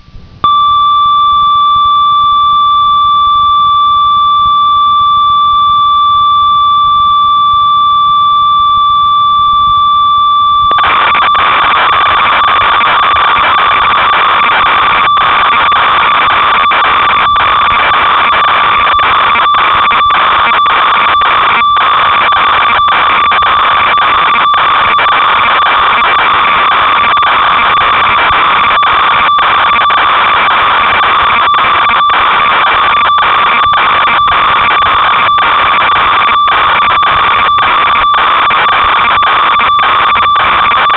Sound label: ob6, synth